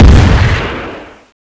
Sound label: rocket shoot; CSO Cronowing; cronobotics54